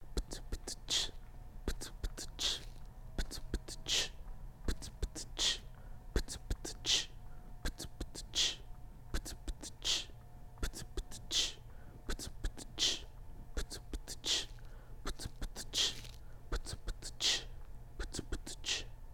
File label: Beatboxing